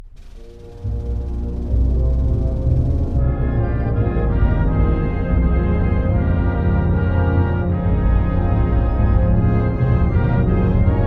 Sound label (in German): Hymne